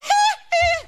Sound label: hee hee; ooh ahh